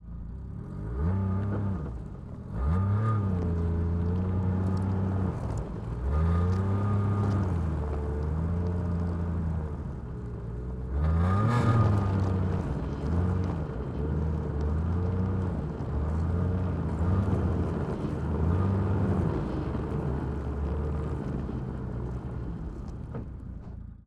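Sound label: yamaha rs venture onboard start blips bogie; Yamaha RS Venture 2006; Pole Position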